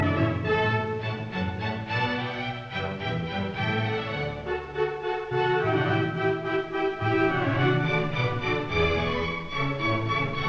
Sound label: Suite in F major, Allegro deciso; Handel; Water Music; arr L Stokowski